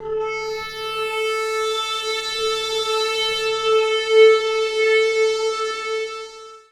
Guitar Textures

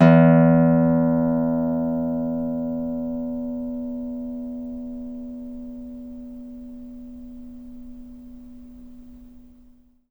NYLON GTR

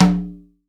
CONGA, STEREO CONGA

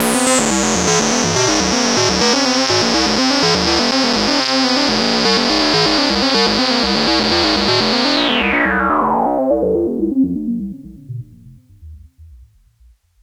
ARP Odyssey FX; Sliced

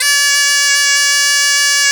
BOMBARDE D3, Celtic; USB Soundscan vol.42; AKAI

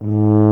tuba G; Miroslav Vitous; Solo Instruments VOL-2

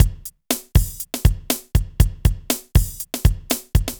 Synth Loop, BPM120

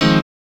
chords

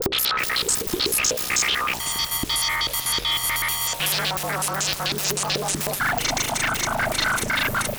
Computer Thinking, Synth SFX; Sonic Foundry (Sony Creative Software); The Electronic Music Manuscript Collection

